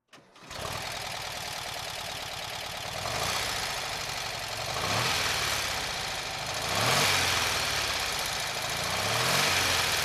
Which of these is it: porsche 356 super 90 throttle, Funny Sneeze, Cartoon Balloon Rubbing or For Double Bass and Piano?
porsche 356 super 90 throttle